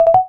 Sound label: Connect; eTrex Touch 2; Proximity Alarms